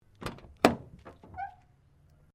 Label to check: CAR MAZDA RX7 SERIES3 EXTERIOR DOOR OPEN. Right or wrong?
Right